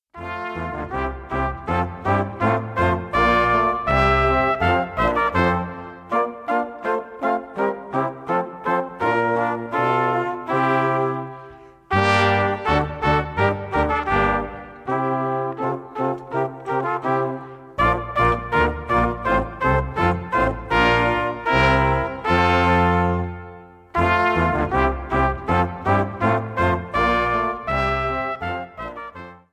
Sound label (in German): Turmblaeser; Kommet ihr Hirten all